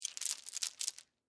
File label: Chain Movement, Industry, Chain, Movement, Heavy, Chain; Factory Sound Effects; Factories